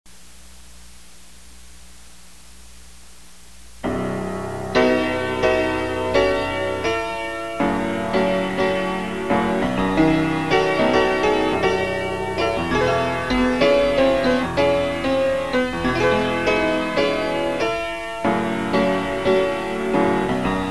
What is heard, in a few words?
PIANO